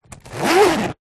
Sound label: household makeup bag thick zipper